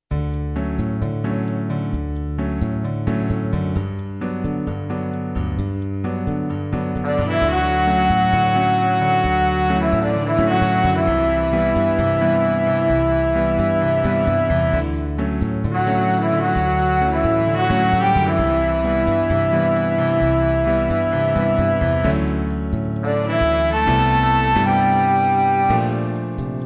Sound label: cafe minus drums